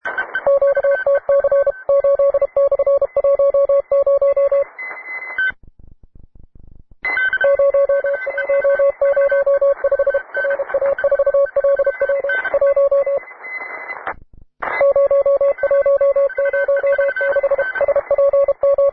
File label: cw; W3BGN